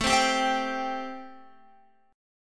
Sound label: A-Minor 7th A Guitar; strummed; Am7